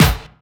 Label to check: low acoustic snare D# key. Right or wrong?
Right